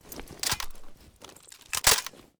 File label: g36c reload; STALZONE